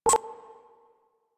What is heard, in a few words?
Button Select, Menu; Warframe